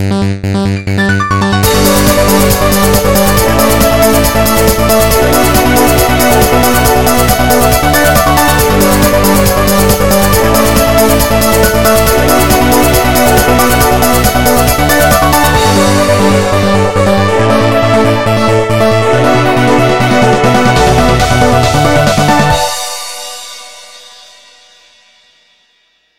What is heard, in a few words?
Nokia E61 Ringtones